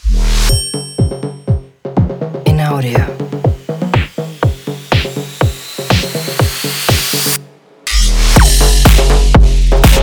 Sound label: Instrumental